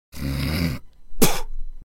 Spit

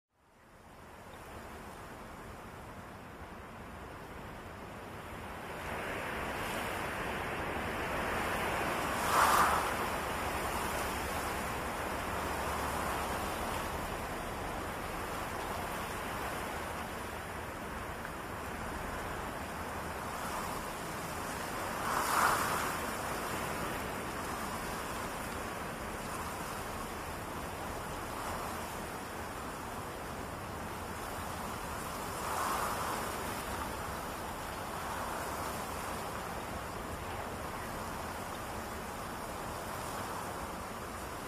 wind autumn; nature

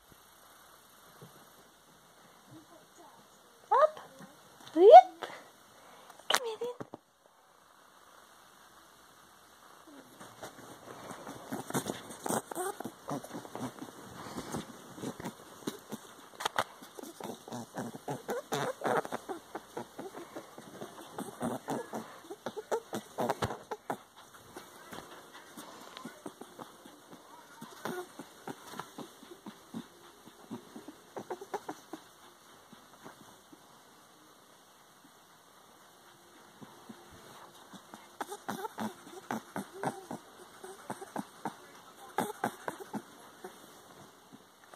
Rabbit playing; صدای خرگوش

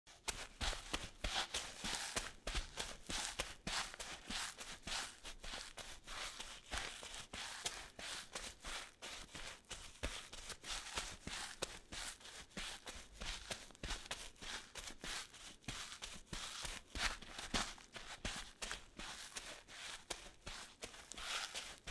Sand Barefoot Boots Shoes Footstep Collection

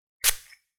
Perfume spray sound

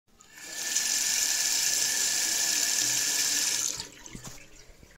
Running Faucet Water Tap Sound

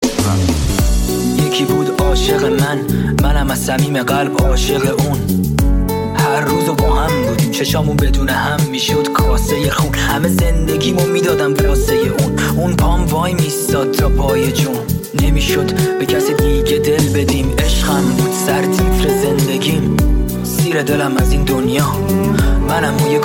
Remix

